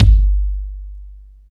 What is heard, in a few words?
KICK, Tekno Industrial; East West